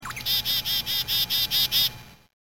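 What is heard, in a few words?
CHICKADEE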